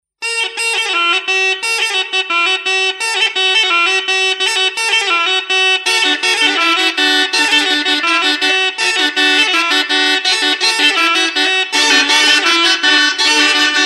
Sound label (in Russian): russkie rojki, rus nar